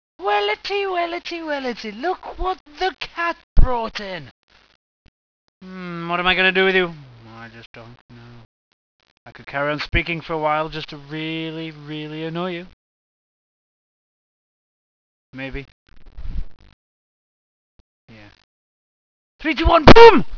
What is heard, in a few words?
boom; dod